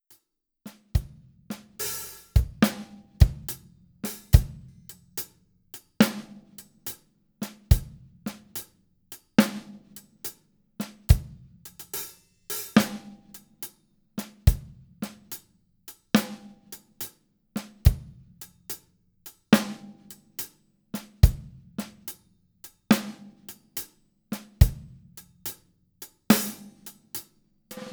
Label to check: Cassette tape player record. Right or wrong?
Wrong